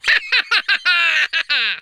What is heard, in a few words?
laugh